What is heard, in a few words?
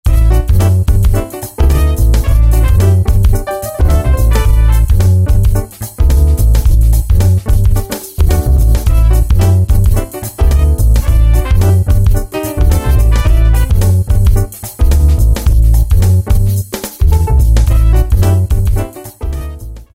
Jazz Funk Pattern, Roland MC-303